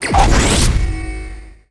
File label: Quest Completed; Custom UI Sounds; Call of Duty Black Ops 2